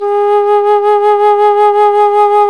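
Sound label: alto flute, vibrato; Roland L-CDX-03 Disk 1; A.Flt vib 2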